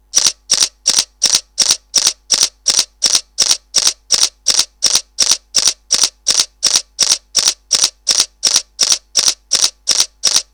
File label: Alpha DSLR-A300 continous JPEG; Sony